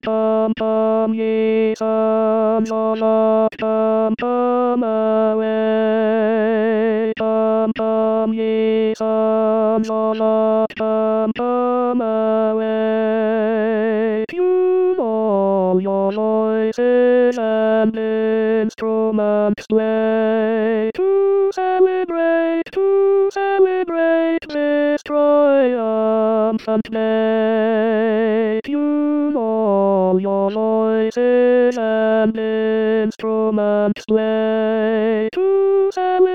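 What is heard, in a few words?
chorus tenor; purcell; ode 1694; come ye sons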